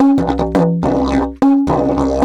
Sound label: 108, DIDGE 108BPM